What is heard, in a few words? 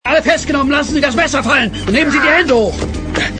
aargh